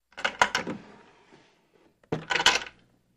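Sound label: Chain Movement Clinks; chains handcuffs & shackles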